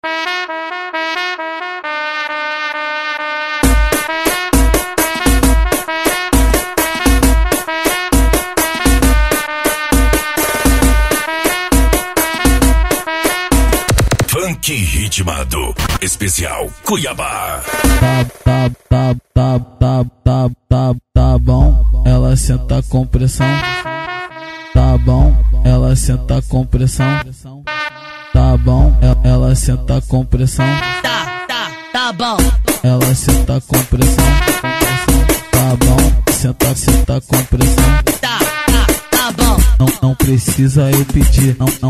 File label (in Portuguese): funk ritmado; especial cuiaba